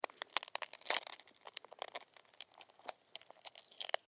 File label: Larva, Egg, Hatch, Crunchy, Birth, Insect, Bug; Sound Effects:Animals:Insects; Blastwave